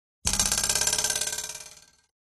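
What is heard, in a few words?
spring sound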